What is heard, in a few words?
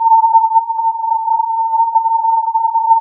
filtered input